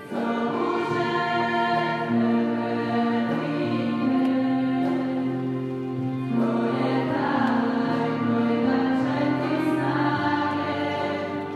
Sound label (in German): Gesang kroatische Gemeinde; Wie sieht Vielfalt an rel Orten aus